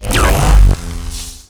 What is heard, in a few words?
vaccinator charge tier; tf2